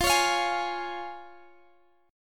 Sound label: F Minor Sharp 5th, chord; x,x,x,x,17,20,19,17